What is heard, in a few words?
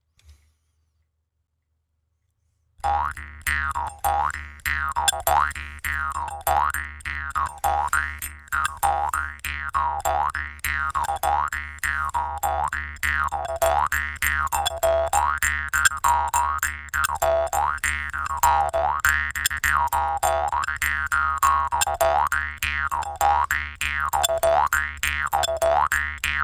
jaw harp 1